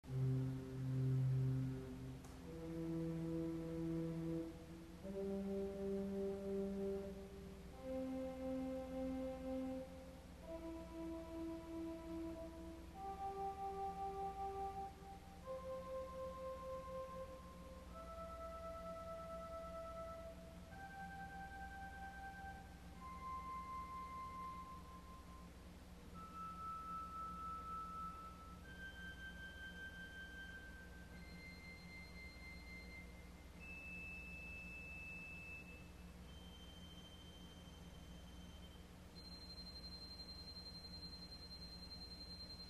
Erzahler Celeste Arpeg, organ stops; CulverAcademy

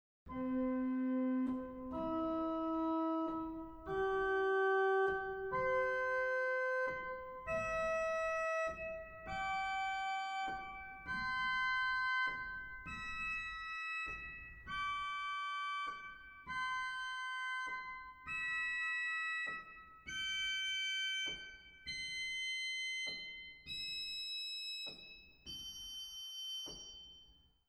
Plein Jeu arp; Mainz

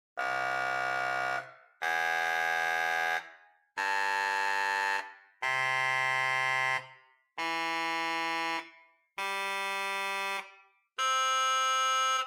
Bw Regal8 arp; Grace Lutheran, Champaign

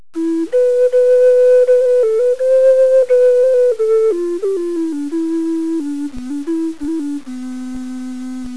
Gemshorn, organ stops

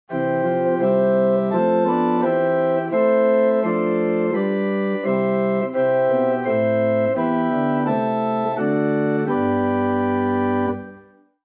Hohlflote + Oktave, organ stops; Grace Lutheran Champaign

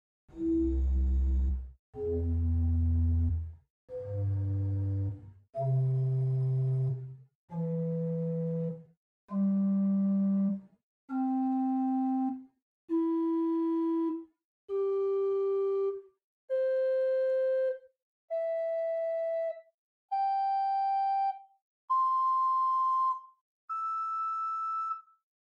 Quintadena 8 arp, organ; Buzard

